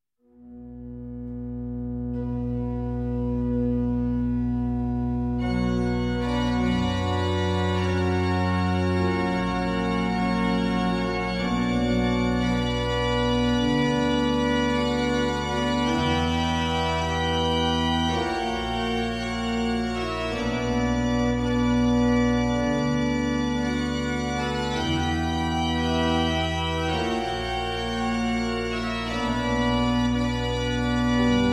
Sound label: Reprise - organ; Three Colors - Blue